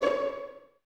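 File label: Violins C#5 pizzicato, String Ensembles; 23 Violins; Miroslav Vitous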